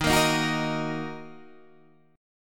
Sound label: Eb Minor, Guitar, Half Step Down